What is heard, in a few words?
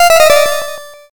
lose